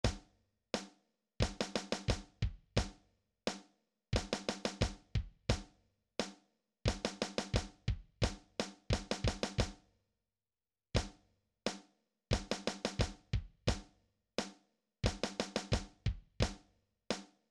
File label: drums; Look Sharp